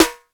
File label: Roland U110 Elec Drums E1